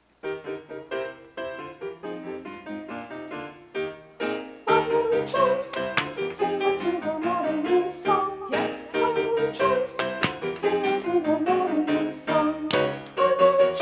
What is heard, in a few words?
with vocals